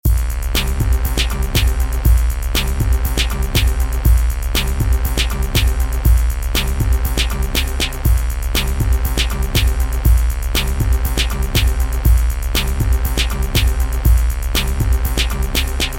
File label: 4 bars simple heavy shit